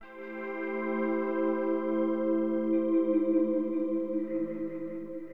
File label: GT PAD, Hip Hop, Guitar; LiveAct Pool